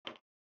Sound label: type; zara